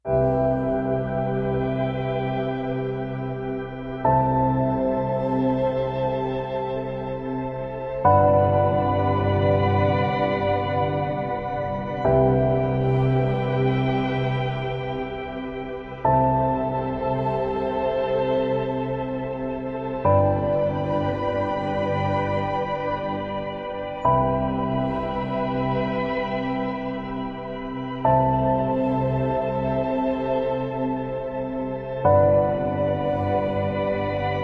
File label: Ambient